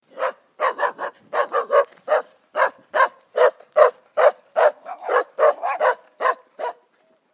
wild dog sound; angry dog; animal

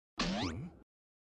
Comical Question Mark Sound Effect

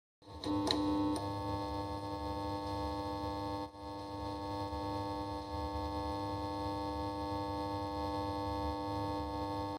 Neon Sound

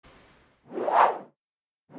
Whoosh; transition

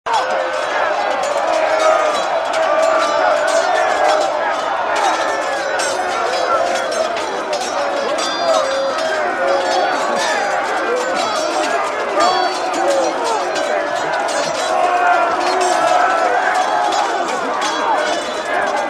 Medieval battle sound

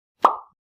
Bubble Burst

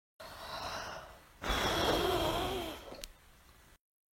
blow out candle sound; human

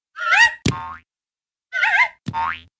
Cartoon Slip On Banana Peel; human

